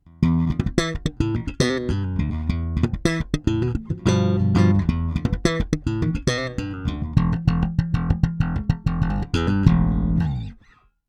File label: Both active boost slap consolidated